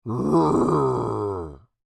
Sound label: Creature, Human, Moan, Throaty; Groaning